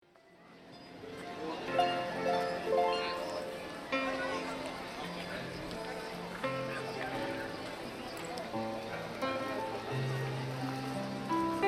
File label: harp, montmartre